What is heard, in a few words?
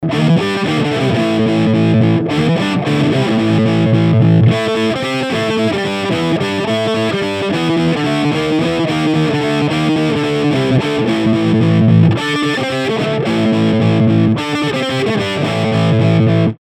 Tap Swing